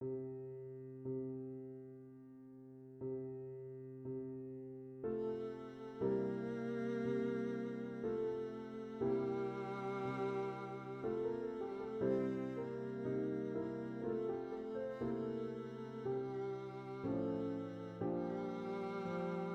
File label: carillon; bizet georges; arla sienne suite